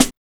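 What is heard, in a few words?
SD, snare drum; X-Static Goldmine 1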